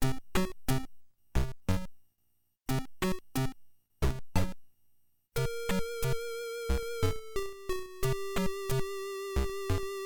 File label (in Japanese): PSG版; fray msx remastered soundtracks 2008